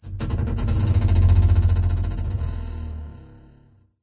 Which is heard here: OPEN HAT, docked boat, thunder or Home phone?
docked boat